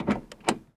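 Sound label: BMW DOOR open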